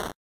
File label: Light Footstep; Robot 64